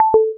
stopping tone; Nokia; E52

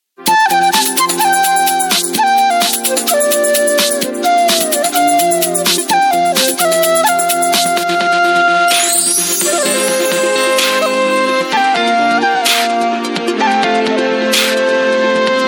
Ringtone, Samsung GT-B3313 Corby Mate; India

